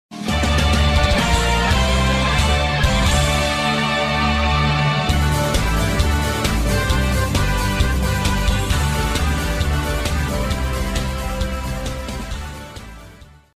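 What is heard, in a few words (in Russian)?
zvuk epicheskoy pobedy, epic victory sound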